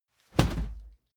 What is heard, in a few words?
whoosh cloth fast hard; swooshes-swishes